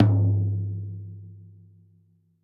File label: tom lo, gretsch kit